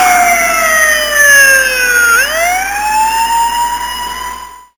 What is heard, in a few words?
police pass